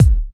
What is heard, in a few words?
good kick drum single shot G sharp key